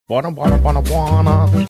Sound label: trying to sing portion of